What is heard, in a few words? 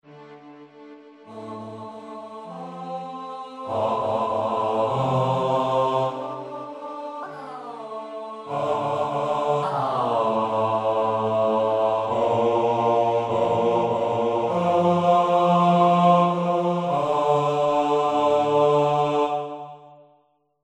Chorus Men